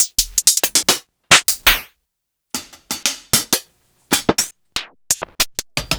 Closed Hat; Lost Tapes From Mars

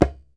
DJEMBE, African Perc

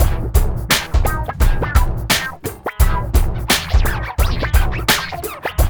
mix, funky loops; East West; Funky Ass Loops

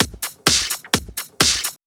Clubhouse Loop 128 BPM

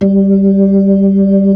B-3

